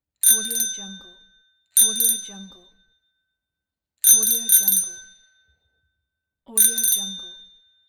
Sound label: bicycle bell ring